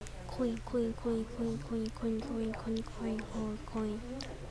coin

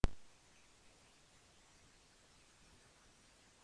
violin

